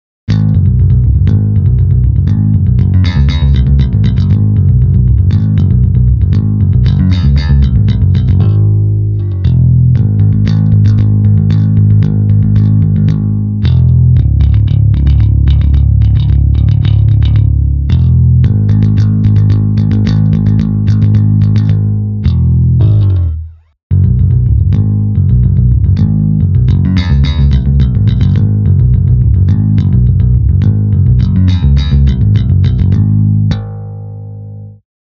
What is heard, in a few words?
pick neck, rock bass; virtual EII BTL MIDI rock bass; pfundstein audio plugins